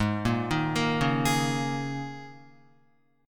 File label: Ab Suspended 2nd Suspended 4th Ab down Guitar; arpeggiated; JGuitar